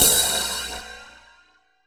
PROCYMB, CYMBALS; USB Soundscan vol.20 - Fresh Disco House I

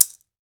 Latin Shakers, mexican

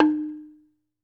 BAMBOO, Pitched Percussn, Bass Bamboo Mrmb; E-MU Producer Series Vol. 7 – Old World Instruments

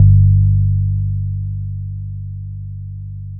Nopick G, Basses, Drum & Bass; Total Drum&Bass

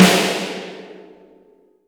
NCSN2LRFMS, drums; Best Service; Real Mega Drums VOL-1

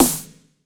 KOMMERZDRUMS

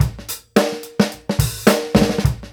groove, Grooves 99-132bpm; E-MU Producer Series Vol. 8 – Platinum Phatt

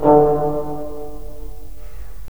BRS, Roland LA Composer

